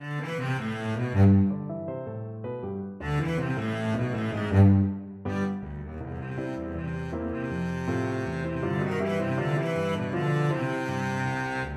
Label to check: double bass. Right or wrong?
Right